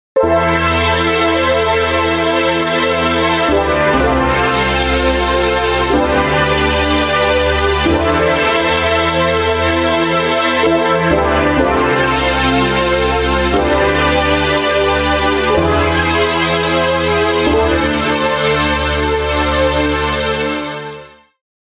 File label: corona wahwah choirs